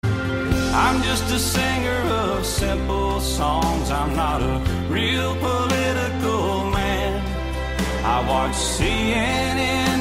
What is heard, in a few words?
English song tone ringtone